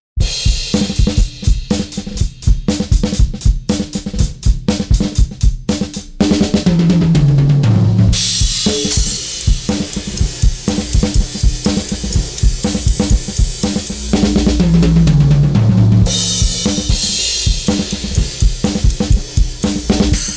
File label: EQ Vintage Tube HMF cut; Kirchhoff